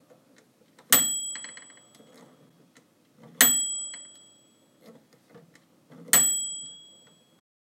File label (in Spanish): Timbre Microondas